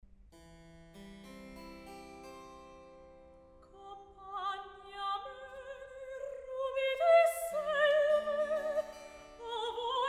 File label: Recitativo; Mozart; Il Re Pastore; Compagne amene